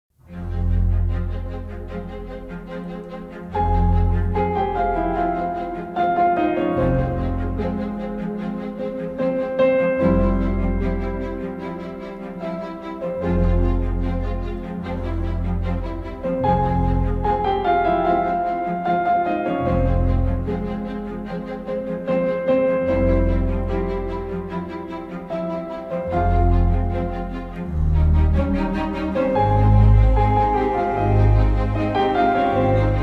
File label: Rondo in F Minor for Piano and Or; Succession Season 2